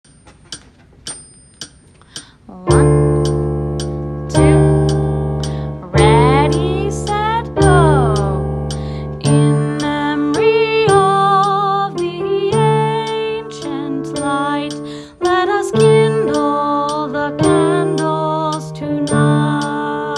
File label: soprano